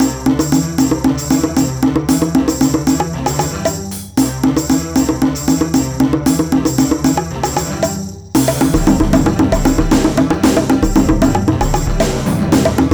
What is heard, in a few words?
Beeps and Bongos